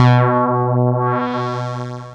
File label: Microwave Ride; instrumente